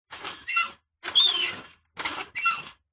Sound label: industrial cart metal pull short screech